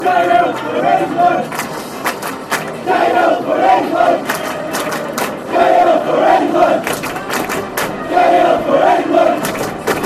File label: england, fanchants; cahill